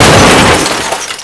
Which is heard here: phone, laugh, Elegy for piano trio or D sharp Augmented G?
phone